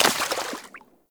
Short Sword Medium Impact Water; Weapon Impacts; Player; Swords; Environment

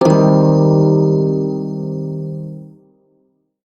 Shawzin Min Chord; Warframe

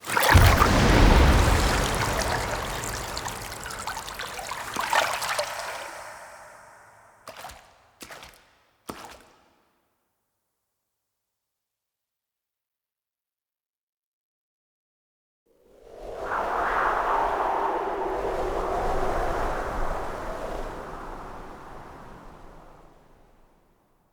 Pull From Water; Nightfold Intro

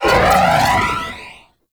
Pump Shotgun Alt Proj By; Weapons; Warframe; Zariman

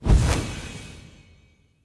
Weapon Upgrade Word Select

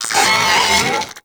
Arachnoid Wraith Vocal Attack; Warframe; Corpus

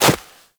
Run Snow, Footsteps; Warframe; Characters; Operator

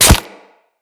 Melee Impact Flesh; Kubrow

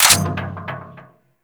Turret Mech, Grineer Crew Ship Turrets; Warframe; RailJack

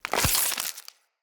Root Spider Foley Jump; Animals

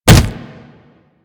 Generic Hit; Warframe; Characters; Tenno

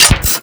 Microwave Pistol Mech; Warframe; Grineer

